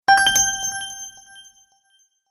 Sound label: Fairy Bright Magic; Magic Sound Effects